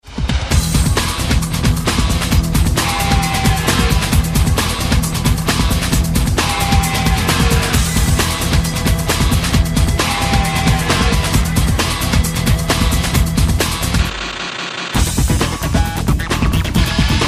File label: instrumental